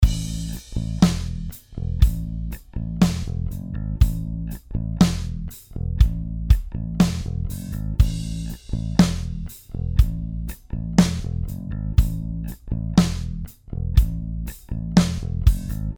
Yamaha Brass Snare FAT

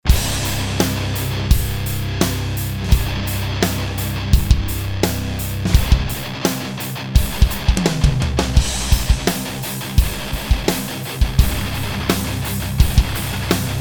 Maple 22 Kick; Drum Werks; DW

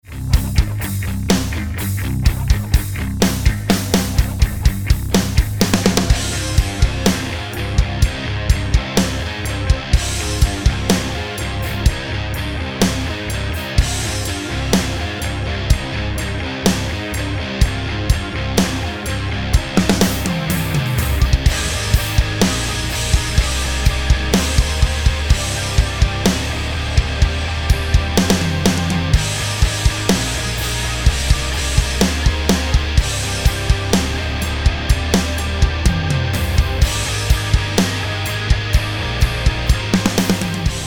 Drums Tama Star Birch; DrumWerks

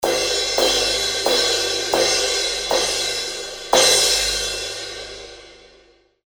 Sabian AAX 17 Crash

